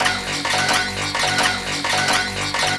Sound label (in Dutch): fx vreemde snaar achtige shit